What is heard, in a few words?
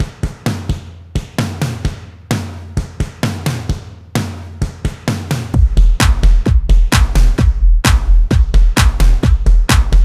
kick compressional mastered